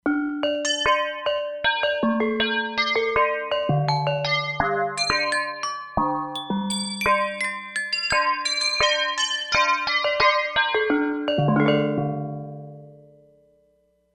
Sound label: Bali Bells, E-mu Proteus-3; Factory patches